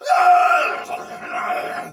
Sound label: Death Soldier Sword Agent Male, Battle; Sinecure